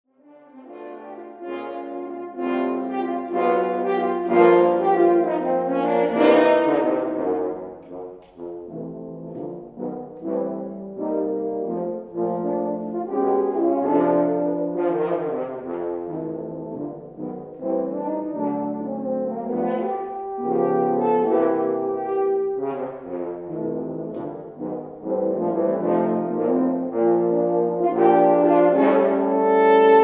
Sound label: Horns; Frippery; Lowell E.Shaw